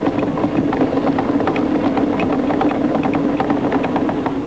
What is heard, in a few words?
woody, ambience